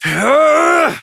Exertion, Adult; Warframe; Adult Operator; Dialog Barks

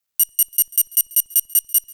Corinth Prime Alt Fire Beep, Heavy Shotgun; Warframe; Tenno

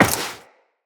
Step Metal, Footsteps Walk; Characters; Tenno

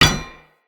Unarmed Punch Impact Metal Shield; Weapon Impacts; Player; Combat

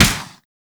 Pursuit Space Ship Turret Fire; Warframe; Grineer; Turret B

